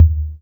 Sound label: Toms; Percussion; Mutekki Media; Vengeance Electroshock Vol.2